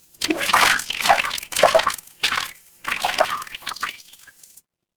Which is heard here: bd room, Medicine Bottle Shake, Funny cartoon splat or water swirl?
water swirl